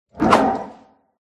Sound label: attack voice mid; haosa